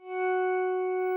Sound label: nyckelharpa F#1; SoundBytes - Early Patches